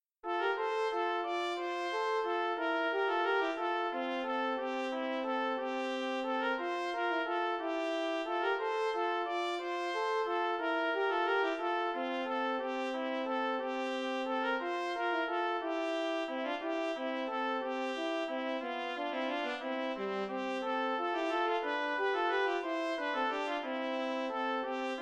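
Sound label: passepied trumpet horn duet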